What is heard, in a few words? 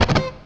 FX07, FX guitar; USB Soundscan vol.31; Miro s Grooves; AKAI